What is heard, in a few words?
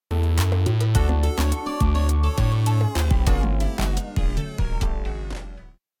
Music Pitch; Mombo; Super Mombo Quest